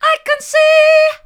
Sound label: i can see mid, vocal